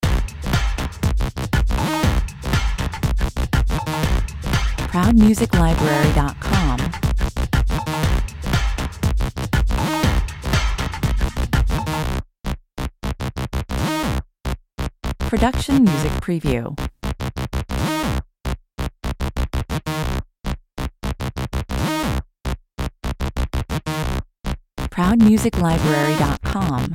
shout out 120 bpm, Phat synth sounds form the basis of this slightly menacing dance track.